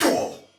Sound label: Pain, Soldier Male; Dialog; Barks; Battle; Sinecure